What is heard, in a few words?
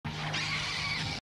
Roar; Armillaria